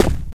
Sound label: charger run right, footsteps; player; source engine; left 4 dead 2